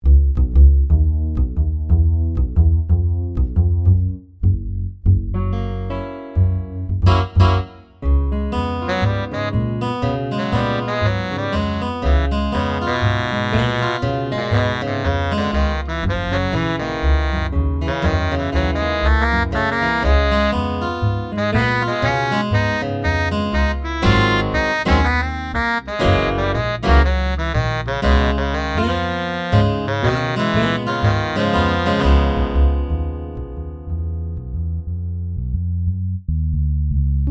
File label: MIDI blues; spellman studio